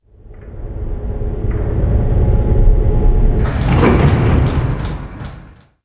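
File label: elevator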